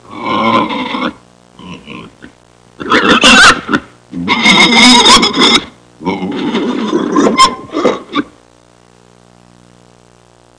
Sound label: Pig growling and squealing